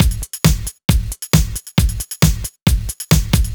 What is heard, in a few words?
Drums, 135bpm, Nu Dance Electro; Zero-G; Soundclash; Combi Loops; All Square